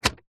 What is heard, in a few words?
electronic typewriter switch slide; office; brother ax 450